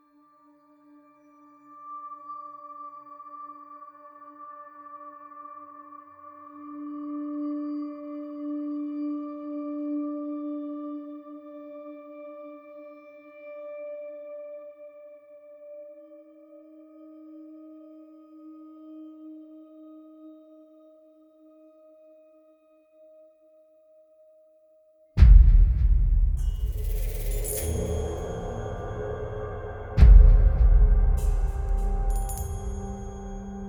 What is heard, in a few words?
music area intro; Aragami